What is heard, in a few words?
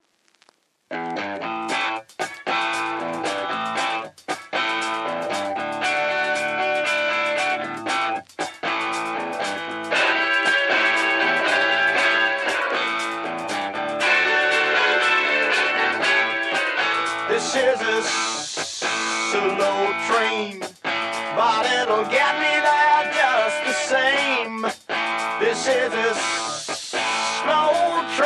mixture slow train